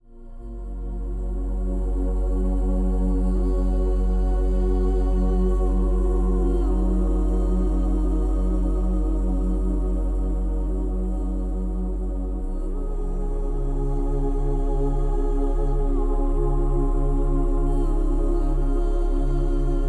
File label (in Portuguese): Ondas Delta Musica Pacifica